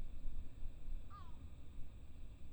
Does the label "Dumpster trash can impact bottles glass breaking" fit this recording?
No